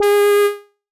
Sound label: G#5 long